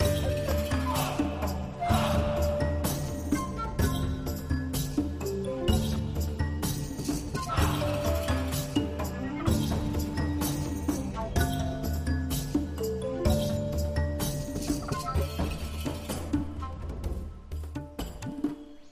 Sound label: general ambiance; games; Aztec Gold Megaways